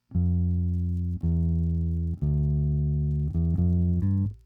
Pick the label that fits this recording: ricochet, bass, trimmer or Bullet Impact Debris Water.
bass